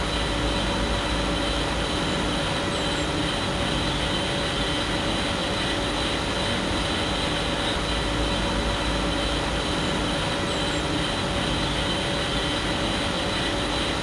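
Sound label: Generator hum, exterior; ENV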